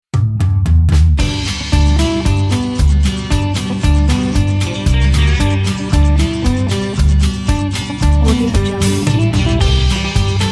cowboy country dance; purpleplanet

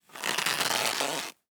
bow stretches wood creaking ripping tearing; prosoundfx

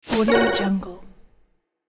throw game accent

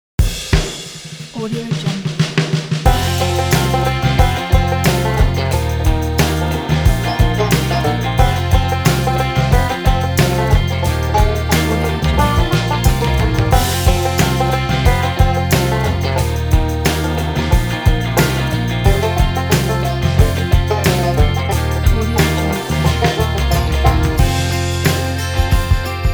southern country bumpkin

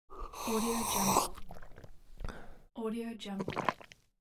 drinking

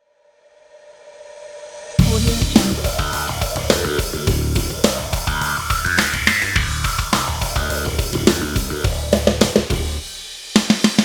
metal rock kit

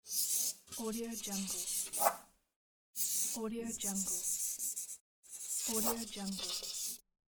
signing with marker

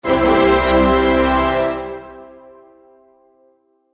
tada orchestra fanfare

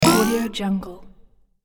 cute little robot jump

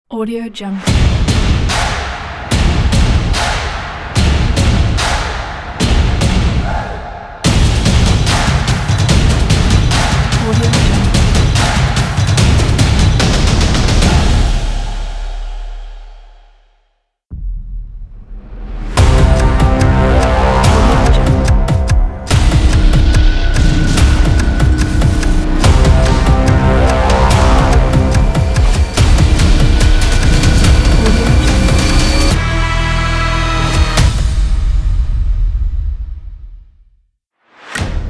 sport logo pack; mrclaps

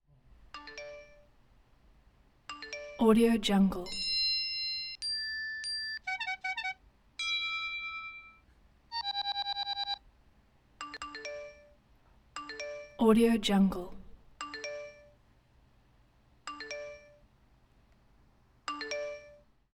iphone sounds; collanderson

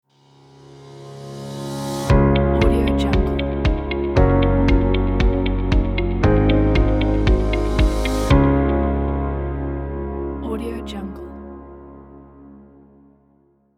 corporate intro logo

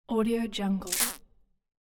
error

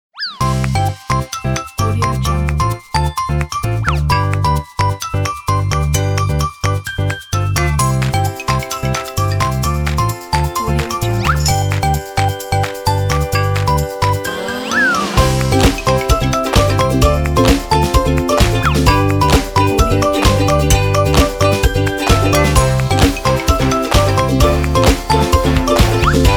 kids cartoon happy logo

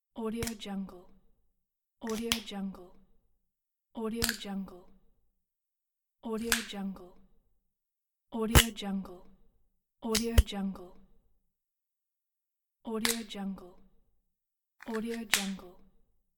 ballpoint pen lid on off

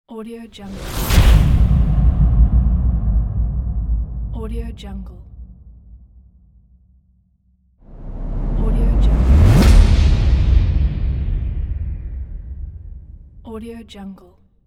impact

